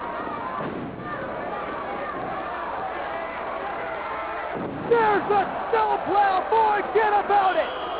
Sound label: snowplow